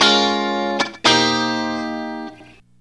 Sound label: GTR LICK; E-MU Formula 4000 Series Vol. 1; Hip Hop Nation